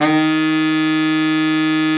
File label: BARITON, Killer Horns, SECTION LOOP; Best Service